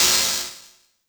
Hihat Open FX

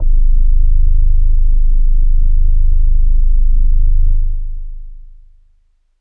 Leslie Gs-1; organ; Kawaii

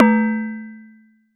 Perc; EFM

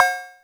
Cowbell

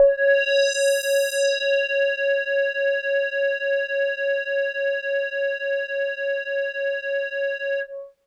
Hologram Kawaii Cs4; keys

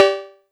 Cowbell

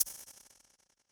Cabasa Air Echo

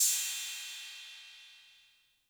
Cymbal 808 Tape Decay, Clean

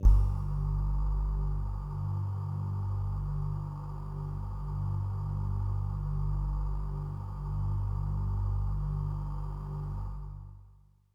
Bell Air Kawaii G-1

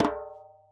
Djembe Hi Flam

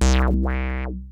BASS

